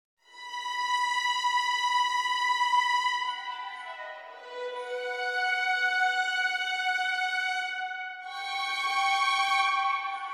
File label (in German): Hintergrundmusik